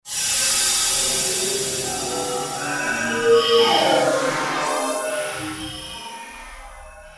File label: d50 intruder fx